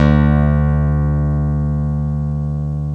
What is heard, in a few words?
ELECTRC BASS; OMI - Universe of Sounds